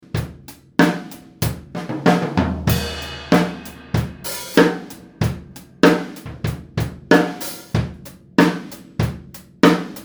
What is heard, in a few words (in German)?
Roland R26 Drumkit Interne Mics